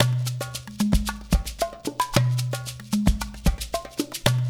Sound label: LOOP 1 R, Percussion; InVision Interactive; GRUNT